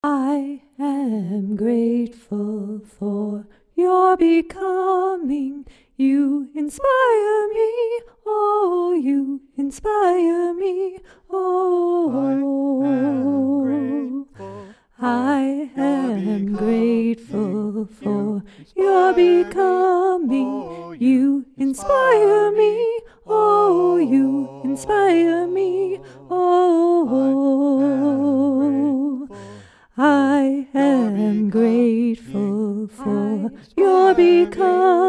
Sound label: Soprano